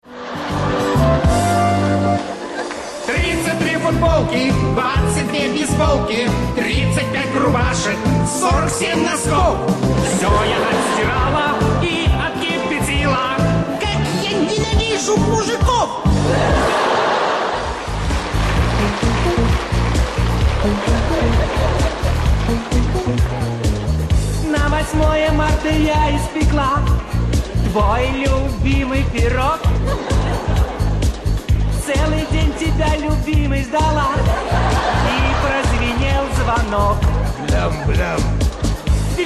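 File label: women's choir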